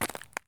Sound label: run concrete; Escape from Tarkov